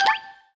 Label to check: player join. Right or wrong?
Right